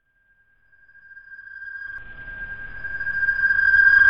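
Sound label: Sub Ping Reverse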